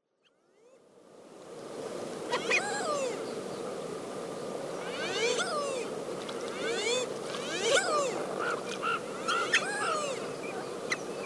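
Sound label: Common Pochard; hoshihajiro; tendo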